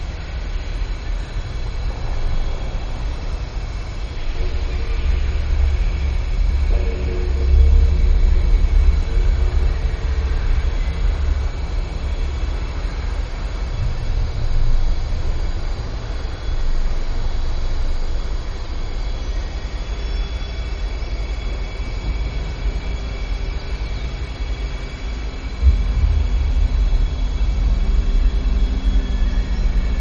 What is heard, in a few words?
lost valley ambience; lara croft tomb raider anniversary ost; peru